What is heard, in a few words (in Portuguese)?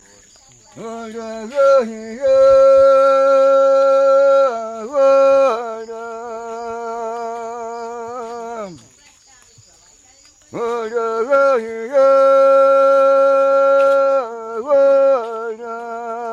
timbiras